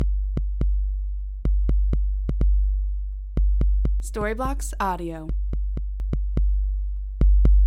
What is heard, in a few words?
Ambient House Bass 125BPM A